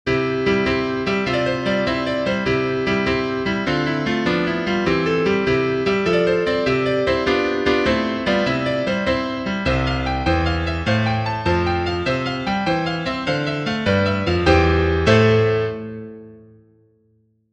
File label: piano, sheet music; Haste to the Wedding